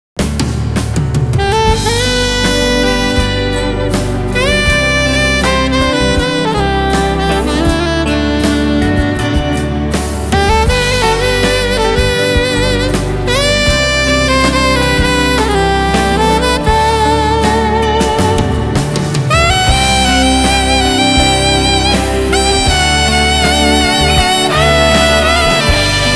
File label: sax